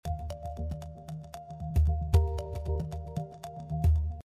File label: Mallimban Ebm7 115